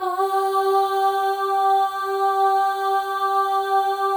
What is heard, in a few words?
AHS, Symphony of Voices, FEM